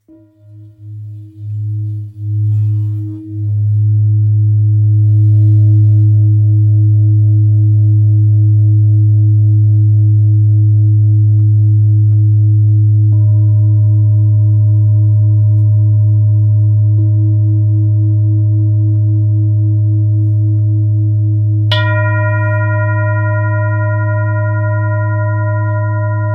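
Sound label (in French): Bengale sol diese, bols chantants tibétains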